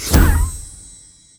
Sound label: poof once